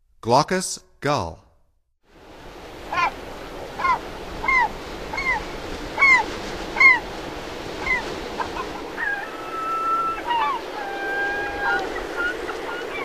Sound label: Glaucous Gull, Songs of Birds of North America; Stokes